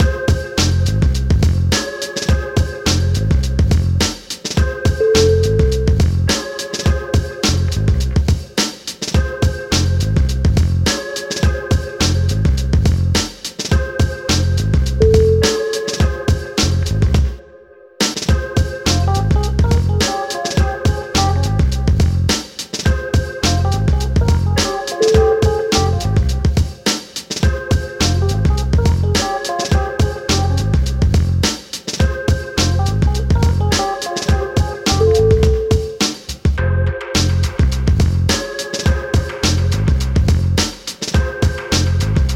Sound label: trip hop